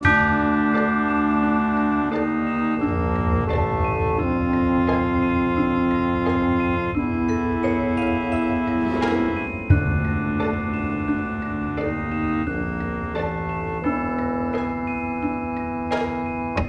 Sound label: Sitar Orch